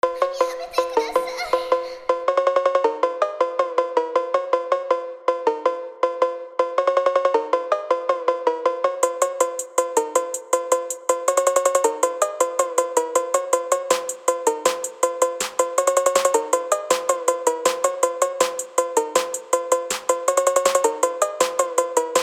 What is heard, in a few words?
Phonk